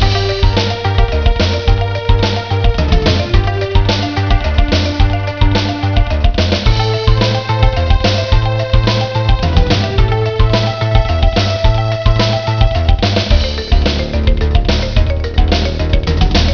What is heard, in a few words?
BGM; NS-SHAFT 1.2